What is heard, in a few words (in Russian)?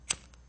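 звук пользования зажигалкой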